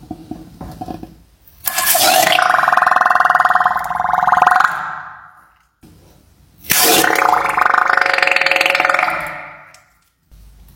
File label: fart